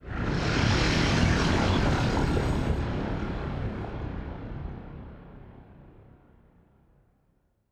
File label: ship science ship move; Stellaris; machineage